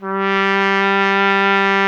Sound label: BRS TP G 00R, Trumpet; Solo Instruments; Miroslav Vitous